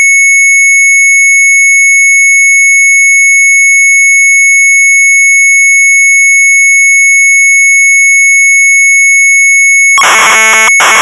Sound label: MZ800 Tape; BASICODE 2 3-800; sharp; retromelsarchive